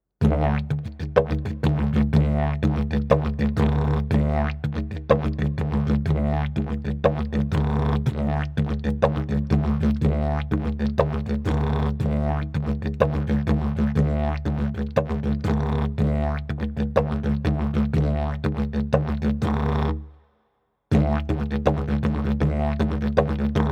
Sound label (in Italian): ritmo livello intermedio